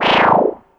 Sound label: Misc Synth FX; musicradar; cartoon caper